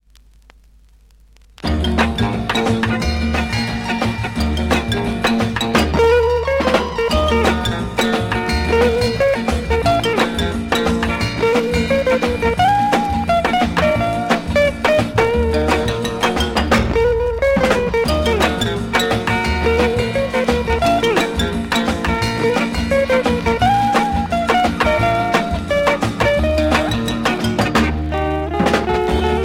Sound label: bell